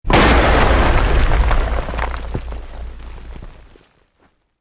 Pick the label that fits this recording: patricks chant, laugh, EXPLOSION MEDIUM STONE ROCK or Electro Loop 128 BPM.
EXPLOSION MEDIUM STONE ROCK